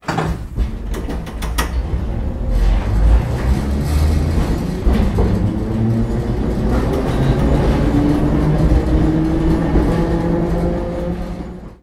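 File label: 1938 stock, train; lul; ff3170; simutrans; Pak128.Britain-Ex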